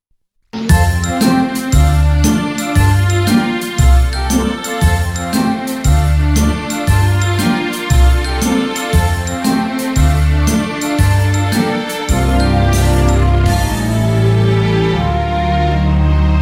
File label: Backing